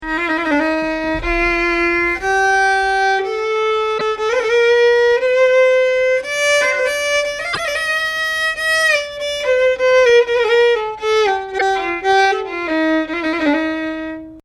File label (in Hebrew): סיגא למעלה, מאקם ומוזיקה ערבית